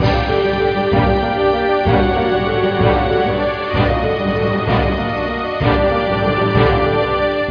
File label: orch